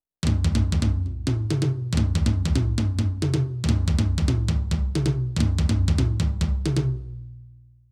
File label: perc 140